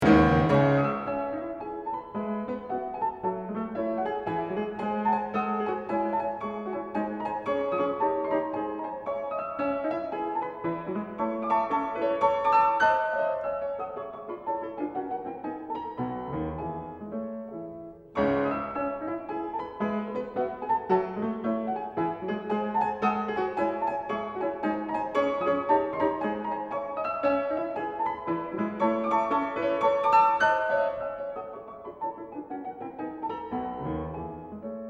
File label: Symphonic Etudes, Piano; Schumann